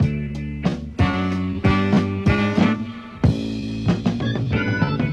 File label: full, Loops Phonky